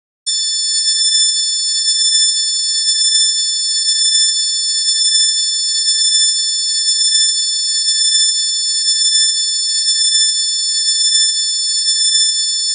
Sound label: hockey organ sound; cheer